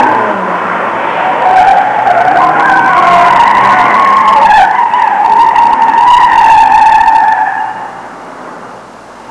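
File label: squeal, Transport; Sound Library 2000; Wayzata Technology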